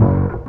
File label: ORG TON; E-Lab; X Static Goldmine 2; akai